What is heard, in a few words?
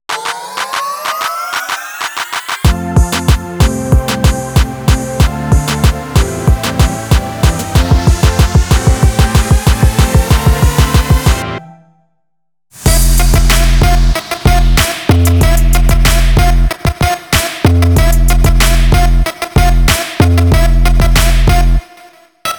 NoVox, dance